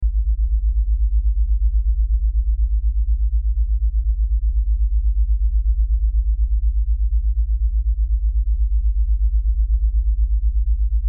Sci Fi Lfe Rumble Drone Pulses; Blastwave

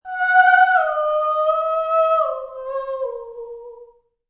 Female Operatic Warm Up, Opera singer warms up; Singing; Voice

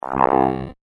Laser Forcefield, science fiction sound effect; Laser Sound Sound Effects; Andrew Fleming

